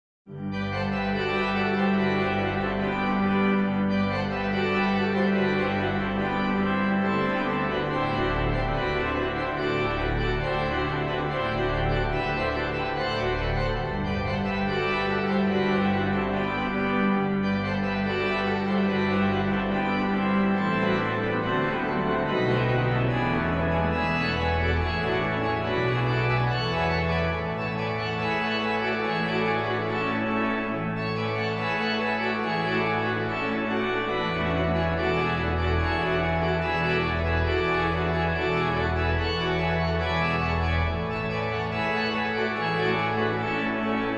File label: Bell Peal